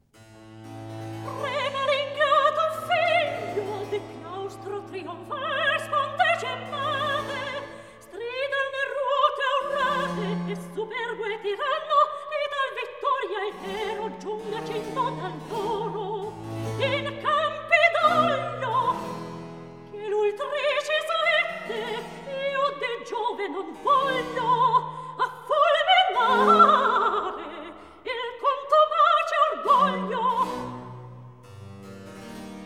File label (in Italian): Recitativo; Agrippina condotta a morire HWV 110; Trema l'ingrato figlio; Handel Masterworks; Klassika